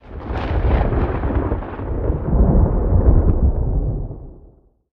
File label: thunder